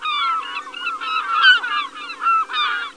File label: Gull; Beached II